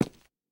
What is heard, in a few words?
rails, walking; Factorio